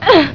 fall; quake2; priss

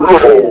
fall; quake2; zumlin

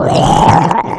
gurp; quake2; fangrin

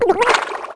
drown; quake2; faerie

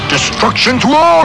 fall; quake2; inferno

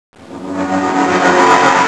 gurp; quake2; okta

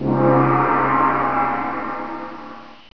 gong; quake2; chaos

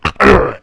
fall; quake2; waste

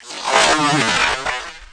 gurp; quake2; martian

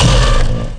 walk, sentien; quake2; monsters

